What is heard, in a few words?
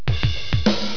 beep; windows 95